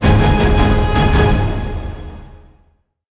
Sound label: Combat Very High Threat End